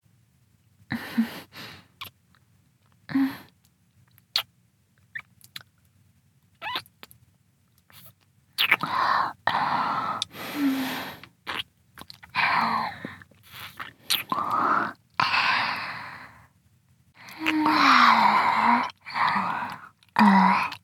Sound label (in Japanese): 咀嚼、唾吐きループ